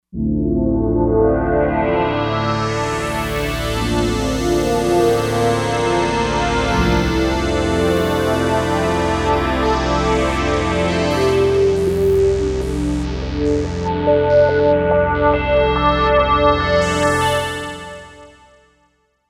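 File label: Fifth Rise, synth pad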